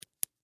Jewelry Earring, Clasp, Close; Foley; Dressing; BlastWave

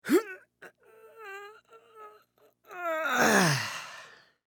Human Male Grunt Strain; Voice

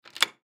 Door Glass Unlock; Locks; Office; Blastwave

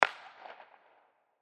Hockey Stick Hit, Hockey Stick Hit Puck; Shot; Sports; SoundEffects-Blastwave

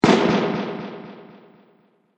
Fireworks Loud Bang With Delay; Celebration, Party, Holidays, Birthdays, Xmas; Andrew Fleming

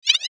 ROLLOVER, Rollover Cartoon Ascend; Mouseover Musical Stab; Multimedia; Blastwave

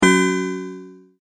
multimedia tone exclamation; McKinney Sound

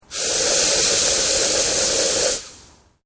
MACHINERY PRESSURE VALVE RELEASE MEDIUM; Industrial machines; Machinery (Sound Effects:Machines)

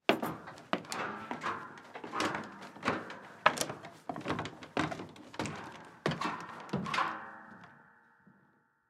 Household Attic Door Pull Down Walk Up; Miscellaneous Doors

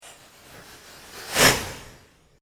PULSE SHORT SWEEP; Sound effects created by manipulating other sounds; Sweepers; Sound Design

